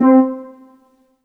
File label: Yamaha TG100 Chiff Ld C4